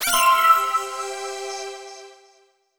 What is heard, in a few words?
Lobby Menu